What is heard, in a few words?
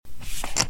pot